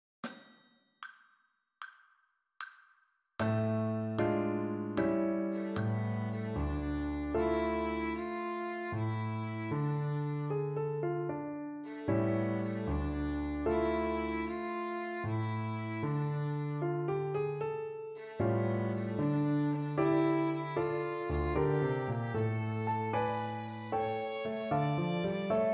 Fiddle blues; Fiddle Time Scales 1

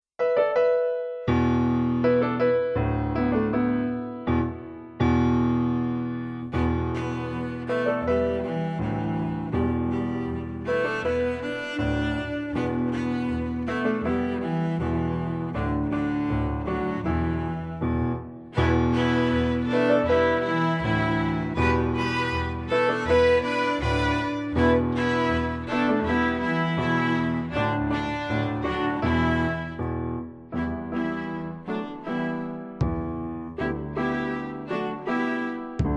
Canoe Song

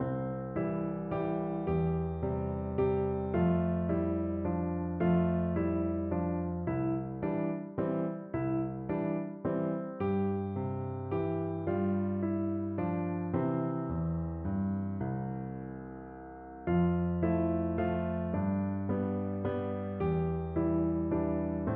Da Capo Waltz

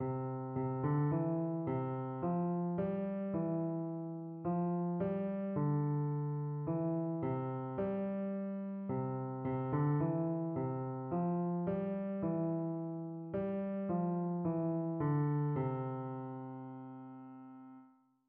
Un Spooky tune

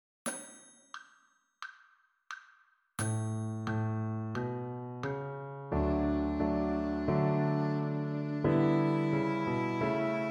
D major scale

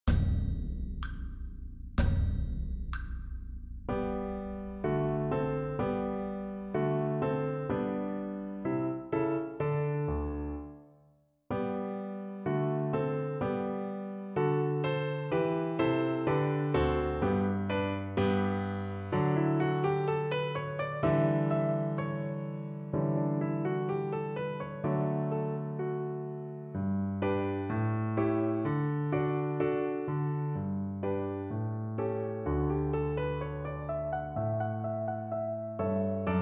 Rondo alla Hornpipe, backing; Grade 3; Barthelemon

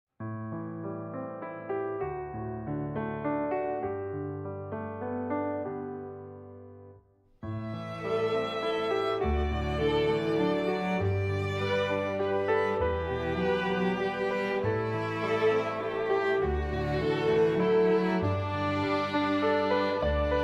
Big wheel waltz

